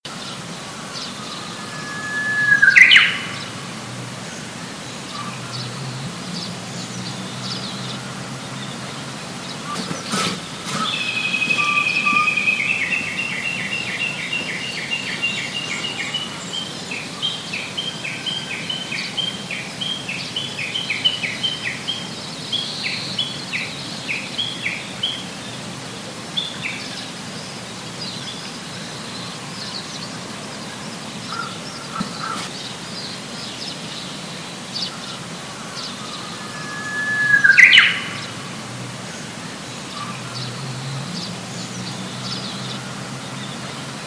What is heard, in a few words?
Uguis